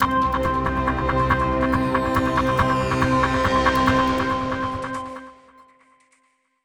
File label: Player Defeat End